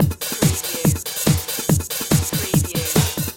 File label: Techno-Trance Drum Loop 142 BPM - Snare Pull With Twist